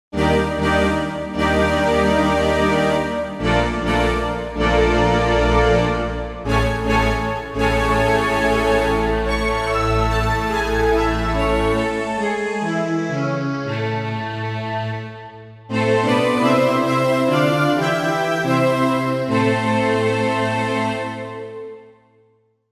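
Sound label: Voice Strings, Korg MSC-1S; Korg MSC-1S Variety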